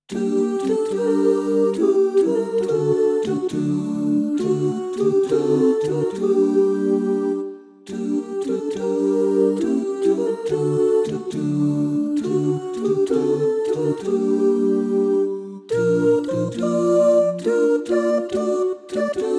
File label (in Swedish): Sopran; Gladjens blomster